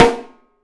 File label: Studio Snare; E-MU Producer Series Vol. 5 – 3-D Audio Collection; 3DPercussives